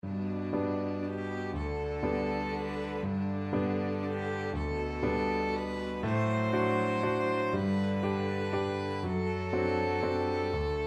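Merry Widow Waltz Arrangement in D Major for violin cello piano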